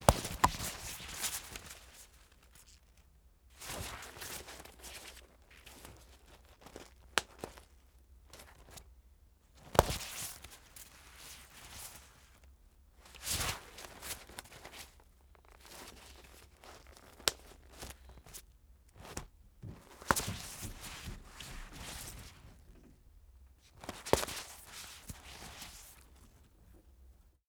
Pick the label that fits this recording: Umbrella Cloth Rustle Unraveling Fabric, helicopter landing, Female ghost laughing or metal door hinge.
Umbrella Cloth Rustle Unraveling Fabric